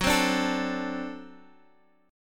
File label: D 7th Gb, guitar